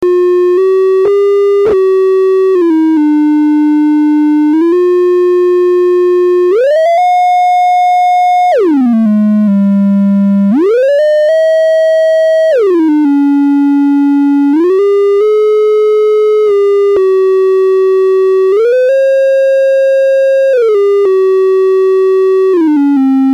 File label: slow samp hold w portamento; voltage quantizer; analog synth